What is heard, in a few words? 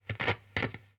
Crackle, Radio